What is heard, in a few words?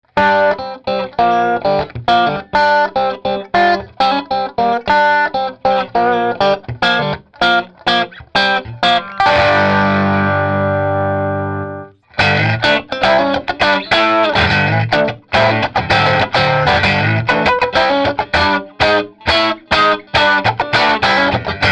BASSMAN; 212; HAMER; amplification